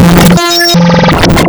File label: BASS FEEDBACK DIGITAL, wavetables; LSDJ; waveedit256